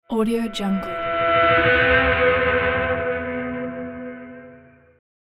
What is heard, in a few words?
guitar mutation reverse